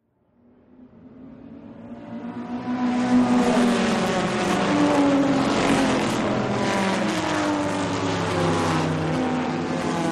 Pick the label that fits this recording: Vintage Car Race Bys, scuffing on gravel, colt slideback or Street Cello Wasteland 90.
Vintage Car Race Bys